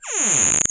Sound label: Creak 1